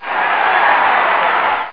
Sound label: CHEER